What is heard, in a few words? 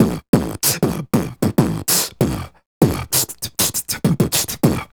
Beat Box, Hip-Hop and RnB Vocals